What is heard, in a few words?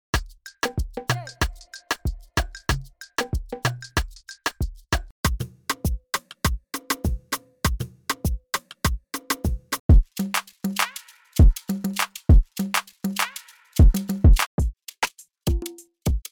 afrobeats drumloops